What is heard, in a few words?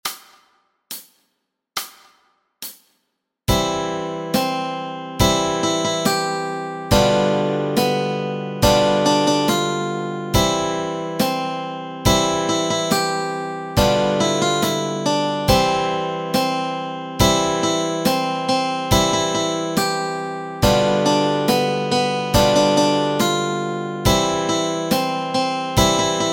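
melody and chords, Easy Guitar and Ukulele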